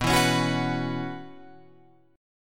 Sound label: B Suspended 2nd Suspended 4th B Guitar Open F, chord; Bsus2sus4 strummed; 11,11,13,13,14,13; JGuitar